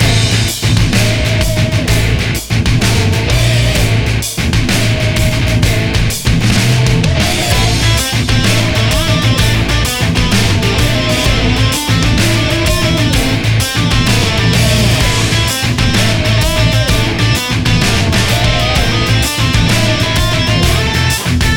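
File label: free spins dragon loop; netent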